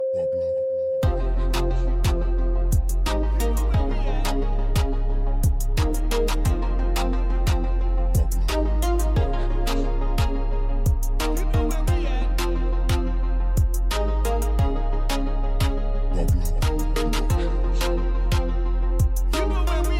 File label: Reggae Dancehall